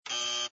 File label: wrong sound